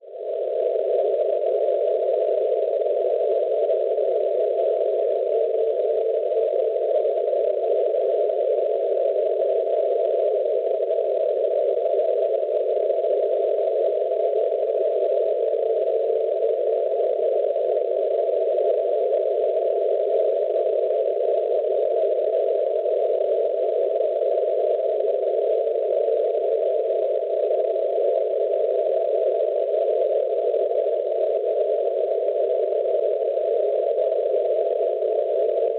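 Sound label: NDB Zilina Slovacchia 508 kHz, beacon; Schwarzsee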